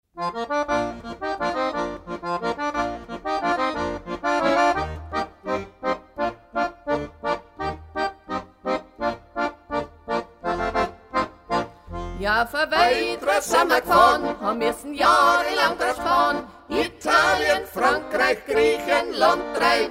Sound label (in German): Zwiefacher, steirische Harmonika